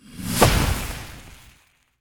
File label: golden bow flare shot